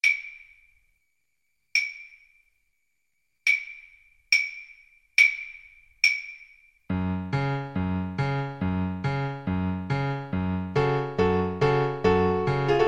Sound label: danses des cygnets, flute